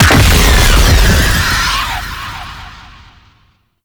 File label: Octopede Wail End Burst; Warframe; ManInTheWall